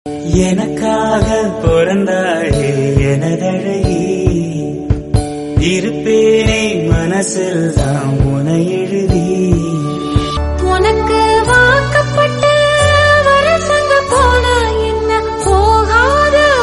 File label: Tamil song ringtone; Wedding Anniversary